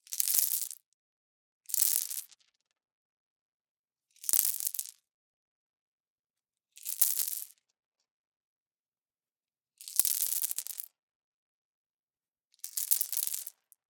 props stones small multiple dropped on the concrete floor mono; Soundholder; Props Box